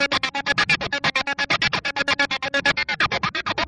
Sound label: filtery 16ths no melody; SYNTH AND ELECTRONIC LOOPS